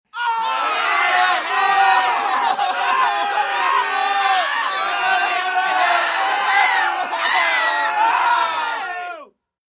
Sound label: Crowd Panic Screams